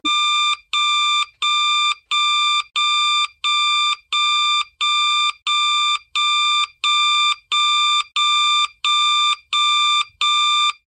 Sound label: bat phone ringtone